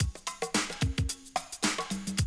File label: Classic DrumLoops, 110